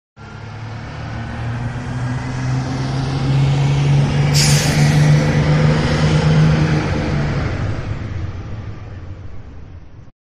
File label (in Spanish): camion